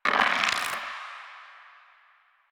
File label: Dice Roll Five; Warframe; Koumei